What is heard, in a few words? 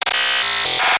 Harsh Buzzer